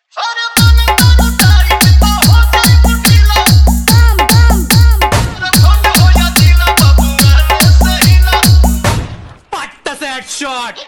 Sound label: New Bhojpuri Dj song remix song Hard bass Remix